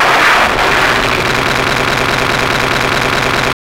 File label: CYBERGUN L, Hard & Loud Techno; USB Soundscan vol.01; AKAI